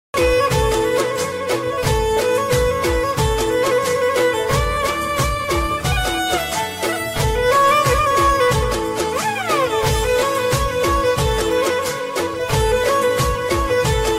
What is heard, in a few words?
ringtone